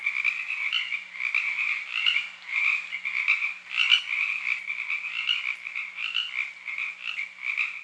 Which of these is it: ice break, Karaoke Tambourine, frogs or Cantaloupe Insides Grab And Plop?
frogs